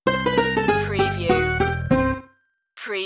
retro video game game over

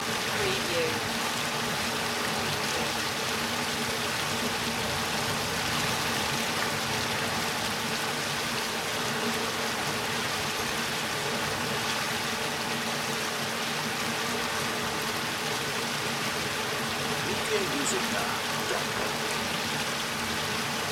splashing runlet loop

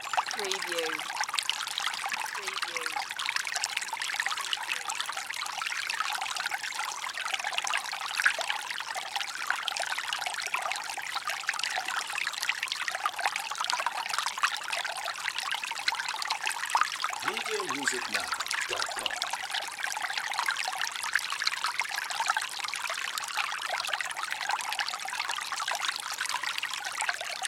Flood water running down a mountain path; Moel Famau

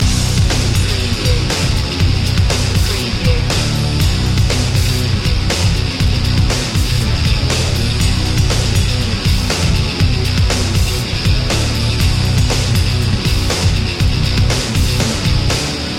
armageddon loop

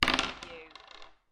Dice Throw Two Dice On Wood Table

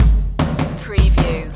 loop start

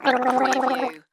Gurgle, Comedy High Gurgle

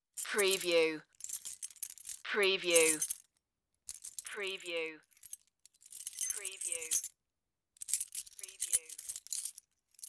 keys movement

